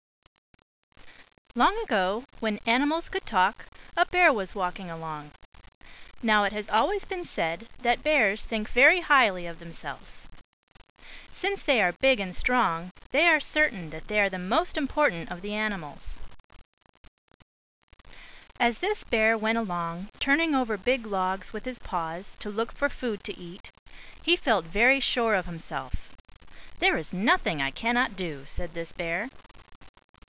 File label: bear